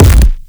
kick loudass

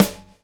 groove; AMG Gota Yashiki Groove Activator

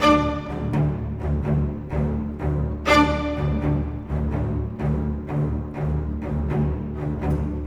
Cinematic Strings, Orchestral

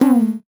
VocoBit Hit, 8 bit; musicradar; 8-bit bonanza

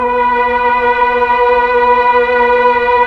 PAD, Slow Str+Vox

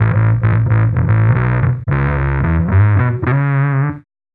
Bass

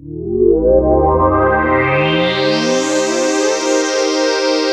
Korg, FX

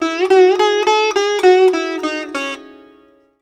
SITAR LINE; SITAR RIFFS2

